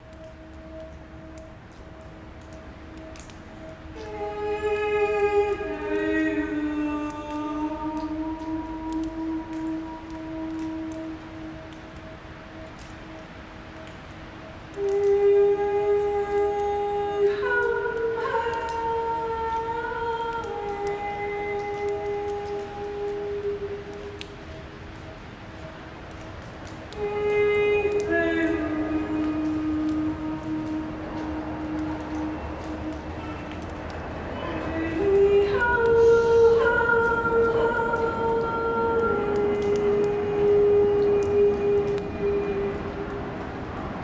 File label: Tunnel Singer With Bowl